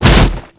Spake Dog Die

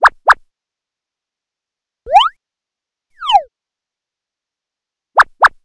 Alpaca Idle

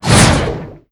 Attack

